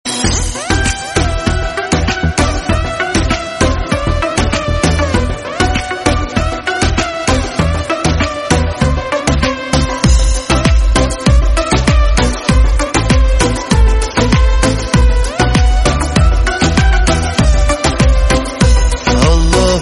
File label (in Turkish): elektro turk zil sesi